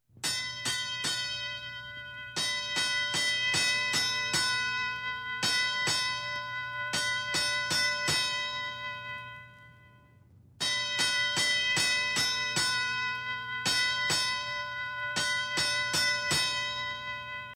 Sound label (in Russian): zvuk kolokola; sms kolokola